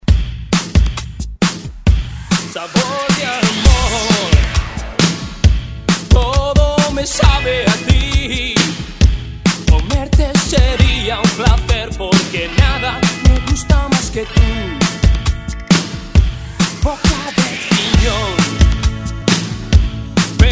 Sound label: ReDrum, 132 Bpm; DJDOLLS